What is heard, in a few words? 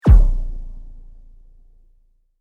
Sweep Mechanical Fast; Created sweeps, swooshes and other movement effects; Sweepers; Sound Design